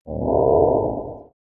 Atmo Random Monster

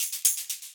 Hip Hop 121bpm; hot lava; ios macos windows

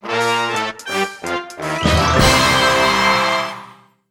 music happyhour song; Garfield Survival of the Fattest; Android

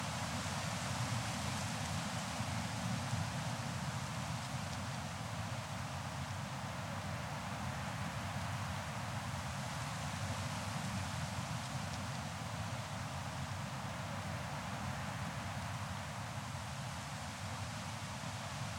Wind front; CS Level2

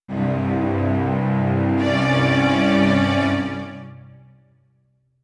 strings

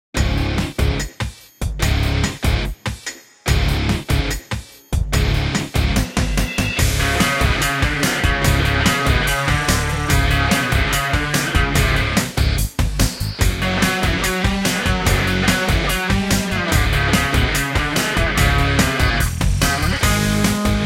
music ingame; bulldozer inc; android game music

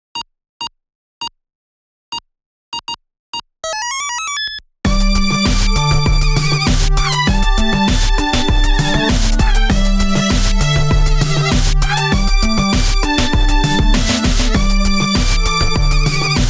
Instrumental; Friday Night Funkin Game Funk Gallery ost mod windows gamerip 2023

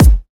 Kick House

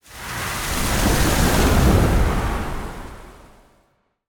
Field Mat Tap; Yu-Gi-Oh! Master Duel

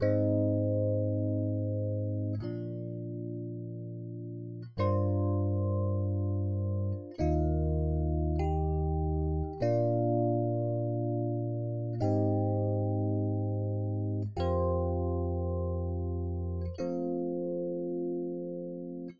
rhodes; Toki Tori 2+; Toki Tori Collection

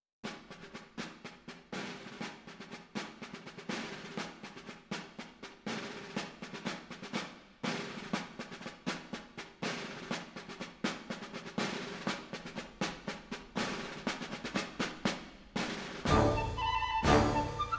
Fife and Drum March; Where in the World Is Carmen Sandiego

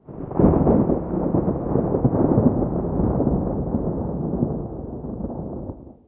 Ambient; unreal development kit

